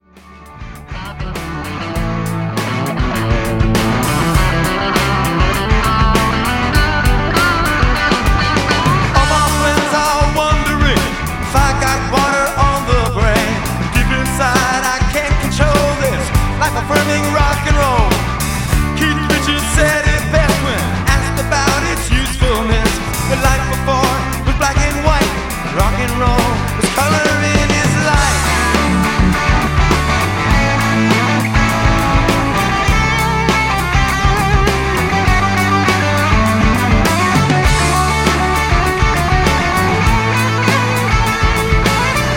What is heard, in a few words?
LA Rock and Roll